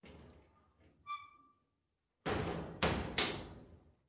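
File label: Locker open close W Slam, Medium Distant, Interior Pov W Squeak.; lockers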